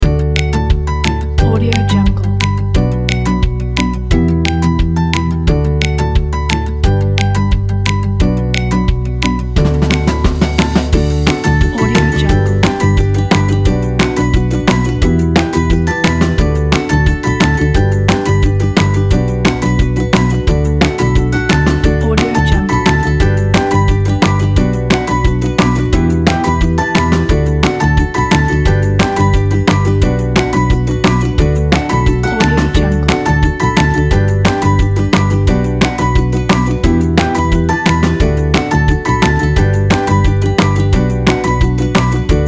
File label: happy melody